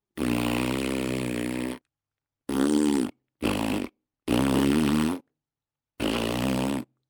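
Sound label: fart sound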